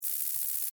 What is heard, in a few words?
Insect Wings Move, Animal, Insect, Wings, Movement, Shake, Bug